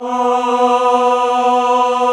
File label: cho B2, Choir Male AH, AKAI S-1000; Retro Sampler